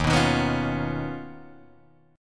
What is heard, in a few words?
Eb 7th Suspended 2nd Sharp 5th Eb, Guitar Drop D; down